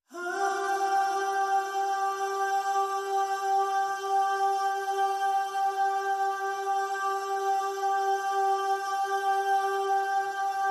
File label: Pop Vocal G, No Scoop